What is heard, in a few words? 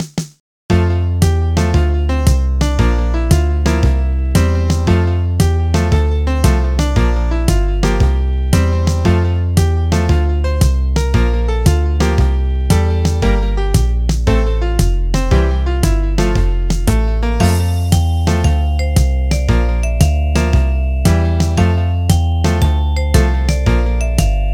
Jazz